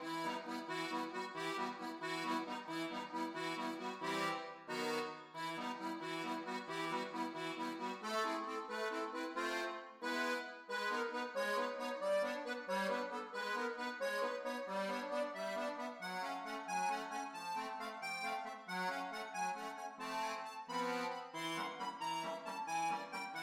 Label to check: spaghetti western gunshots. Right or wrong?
Wrong